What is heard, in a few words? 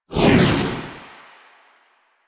Motorcycle Passby Whoosh Medium; Motorcycle Ambience; Vehicles